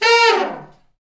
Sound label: BRS SHFALL, Brass Sections; Roland LCDP06; Fat Section